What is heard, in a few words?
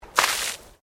Water Splash Concrete Small; Elemental weather effects; Nature